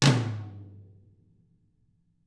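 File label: DW 12 flam; DW TOM; ILIO; Double Platinum Drums 1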